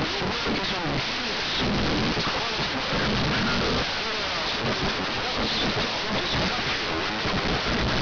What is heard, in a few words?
4bit quantization noise